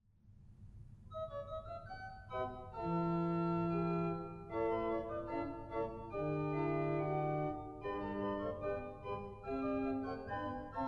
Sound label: Ch 8 and 4 Flutes